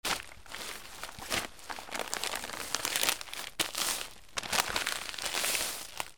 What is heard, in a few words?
PAPER